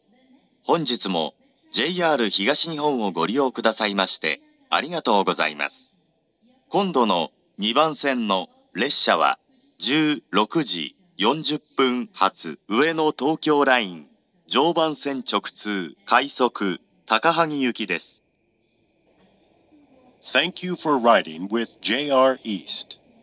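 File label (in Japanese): 新橋駅 発車メロディー; 東海道線（上野東京ライン）; 東京・上野・宇都宮・高崎・取手方面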